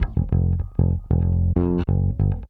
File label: Bass, 70s Analog Funk